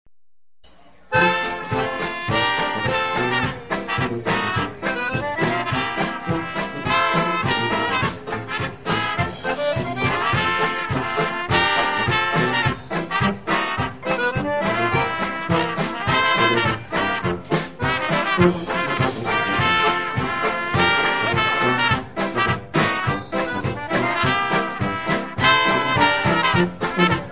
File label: Polka